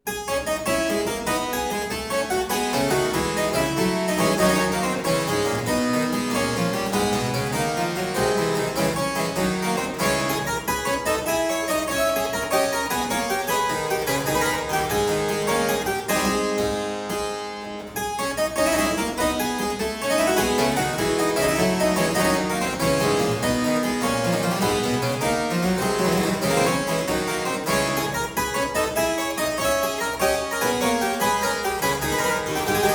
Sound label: Suite in D minor - Gigue, harpsichord; Buxtehude; Complete Harpsichord Music; ed. E.Roger, 1710